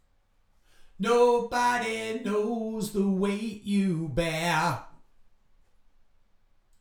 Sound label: vocals